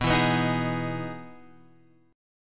E Major 7th B, chord

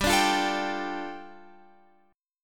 G 7th Suspended 2nd G, Guitar Open F; G7sus2 strummed

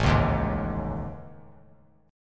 A Major 7th Flat 5th, down Bass Standard; AM7b5 strummed; JGuitar

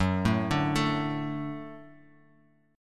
F sharp Minor Sharp 5th F sharp; arpeggiated; JGuitar

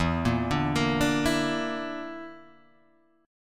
D Suspended 2nd Sharp 5th E

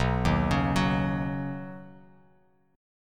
B Major Add 9th B Bass Standard; Badd9; arpeggiated; 7,6,11,11

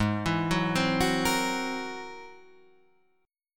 G sharp Augmented 9th G sharp

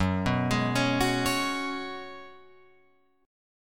Gb Suspended 2nd Suspended 4th, Guitar, Open F; arpeggiated; 6,6,8,8,9,8

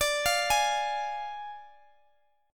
D Diminished, Guitar, Standard; Ddim arpeggiated

